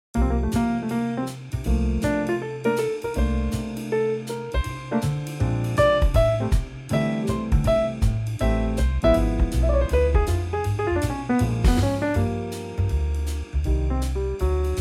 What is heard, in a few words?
F trio